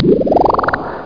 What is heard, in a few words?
BUBBLING